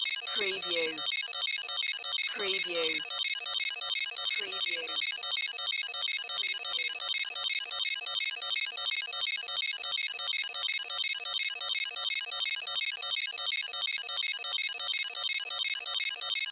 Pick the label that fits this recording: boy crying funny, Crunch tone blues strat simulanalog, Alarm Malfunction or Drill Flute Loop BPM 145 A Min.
Alarm Malfunction